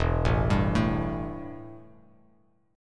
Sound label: E Minor Double Flat 5th E, Bass Standard